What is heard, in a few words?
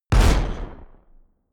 sci-fi gun mechanic fire hard; pistolen gewehre